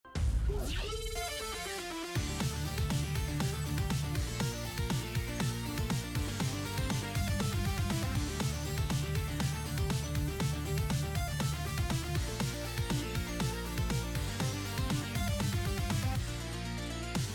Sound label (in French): musique generique fin